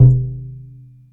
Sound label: JUG HIT